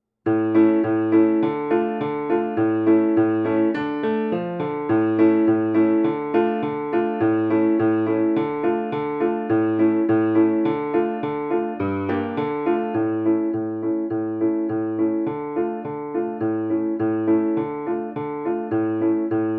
Russian Dance, 104

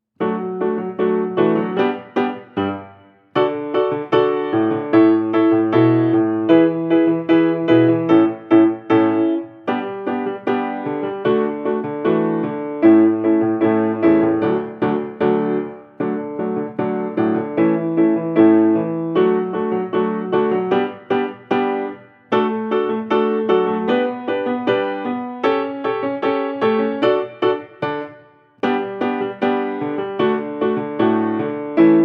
Rollercoaster Rock, 152

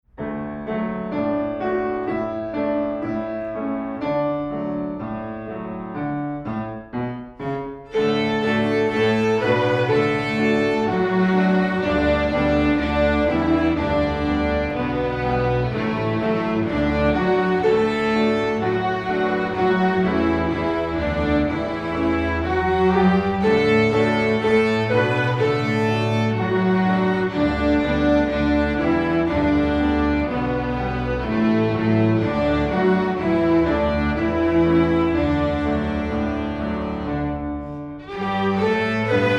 Country Ballad

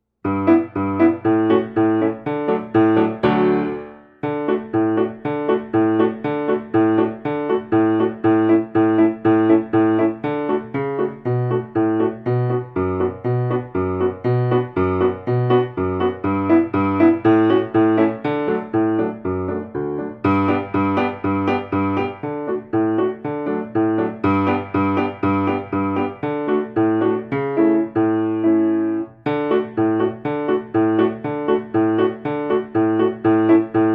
Circus March, 120

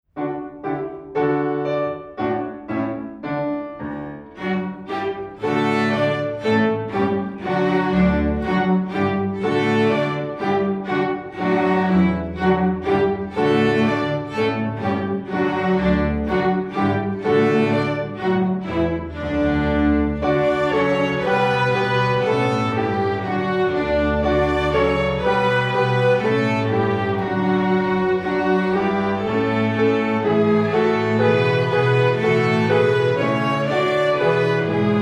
Gavotte

